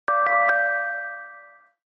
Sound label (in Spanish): tono campana metalica; whatsapp